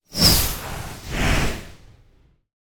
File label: Whoosh Ripping Air - Double; Hollywood Edge; sound designer's toolkit v2